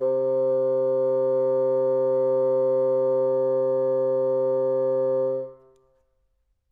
s bassoon; interpolator

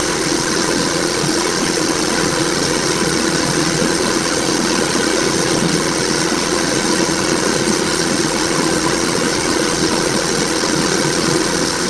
blender

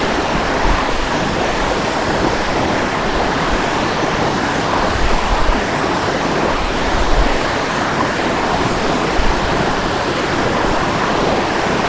waves

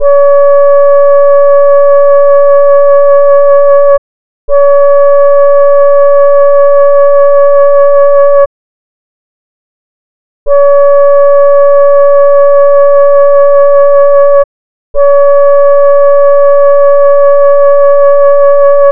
vibrato; Modeles Physiques

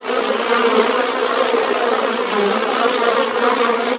bees

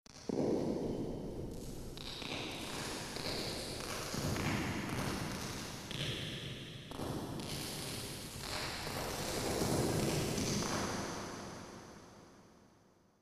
Hall Big bright

